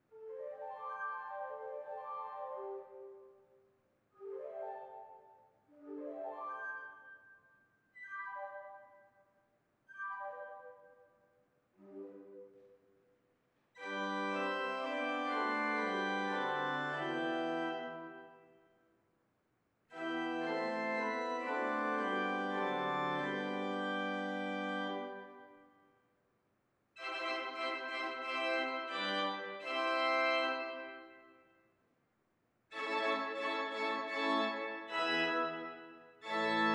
Organ Test Supercardiod Sennheiser Shotgun Mics (Audience); Pioneer Memorial Church; Kenneth Logan